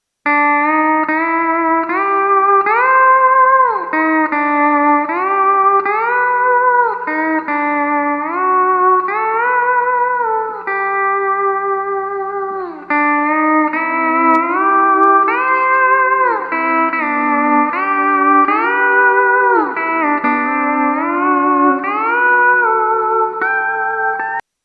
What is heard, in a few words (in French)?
instruments guitare pedal steel